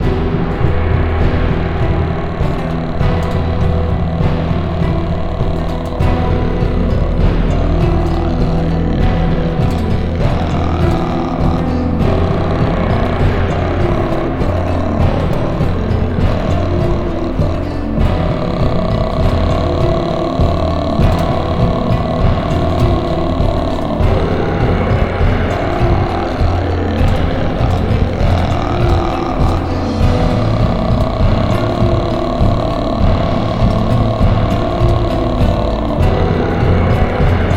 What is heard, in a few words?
Tension Mongolian Full; Real Warfare 1242